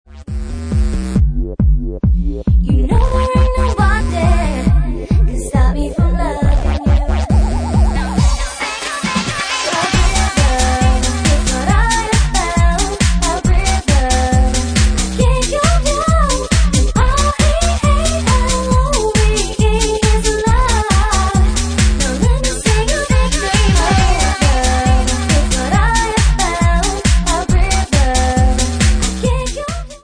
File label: Bassline; Bassline Meltdown Session 1